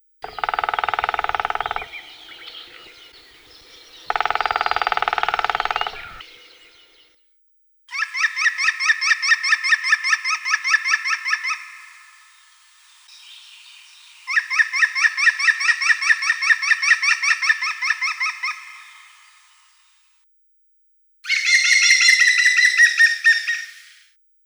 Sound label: Picus viridis